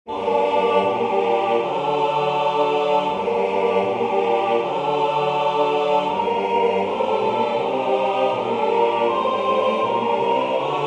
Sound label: Dirge the death of ase Tutti; E.Grieg